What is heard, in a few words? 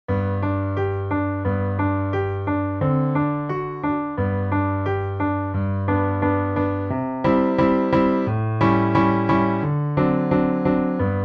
accomp, level2violin; The Violin Fun Book (Level 2); Come Back to Erin